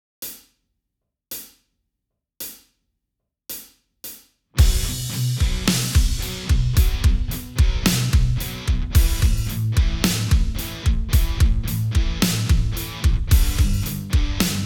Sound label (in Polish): przebieg 55 bpm